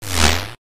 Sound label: whoosh